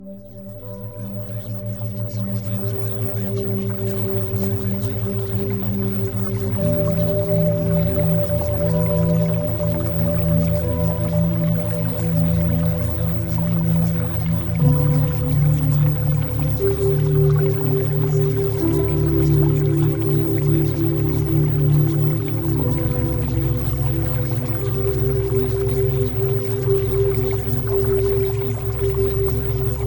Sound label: Music Theta Waves; Premium Subliminals